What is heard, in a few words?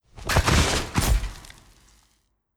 Godess Fall